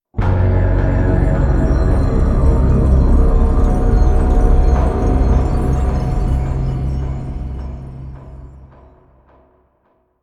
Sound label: UI Stinger Complete; Starcraft 2 Wings of Liberty